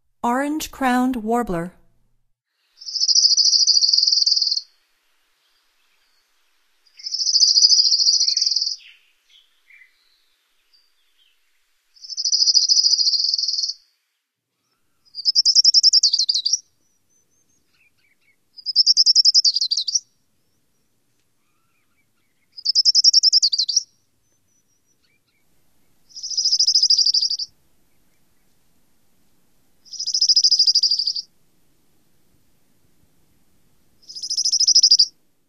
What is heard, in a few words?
Orange-crowned Warbler, Songs of Birds of North America; Stokes